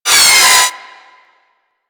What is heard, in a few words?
Metal Impact, Weapons; Warframe; Tenno; Miter; Hit Effects